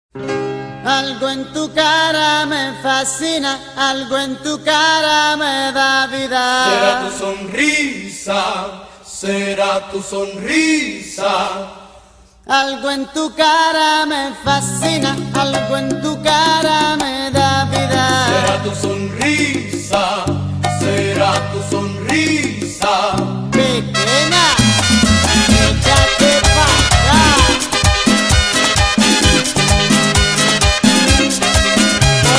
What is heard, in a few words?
samba